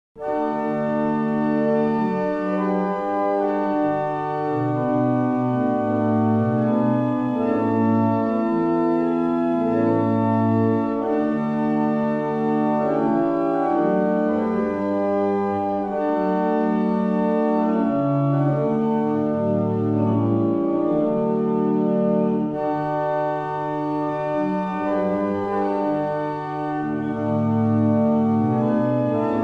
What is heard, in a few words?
Double chant, barrel organs; Avington; Robinson